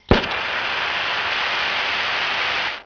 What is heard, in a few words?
Blowtorch, industrial